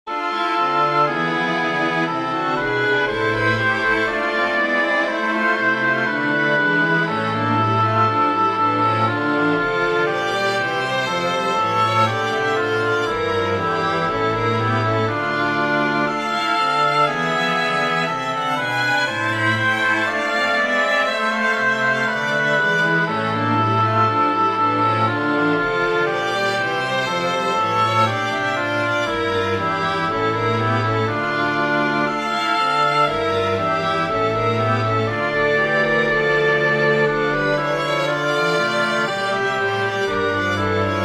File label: prelude for oboe strings; bach johann sebastian; christ lag todes banden